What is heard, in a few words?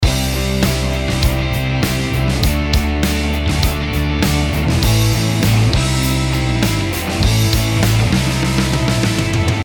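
snare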